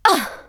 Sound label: Emote Effort; Twitch Skijump